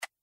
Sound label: selector hover; Stampede Call of the Pride; Eyecon